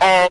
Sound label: "ah"